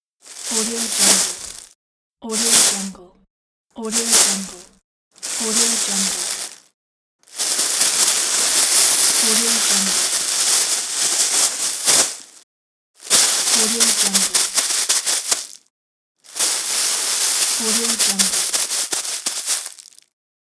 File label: plastic bags manipulation pack